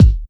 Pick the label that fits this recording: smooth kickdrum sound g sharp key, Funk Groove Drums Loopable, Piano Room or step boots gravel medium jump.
smooth kickdrum sound g sharp key